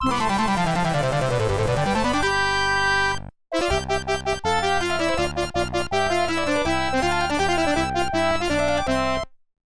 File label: Game Clear